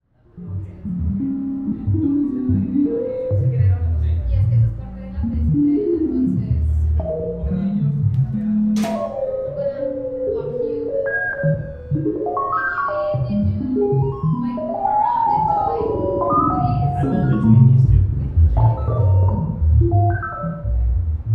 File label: Body Sounds Performance